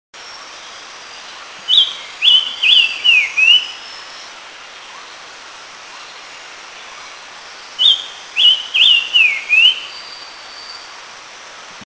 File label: Silver-eared Mesia, Eaglenest, India